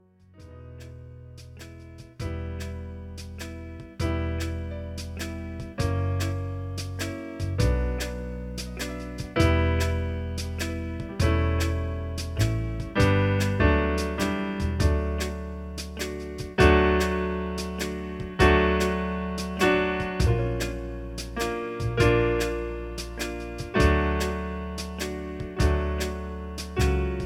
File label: backing track chorus only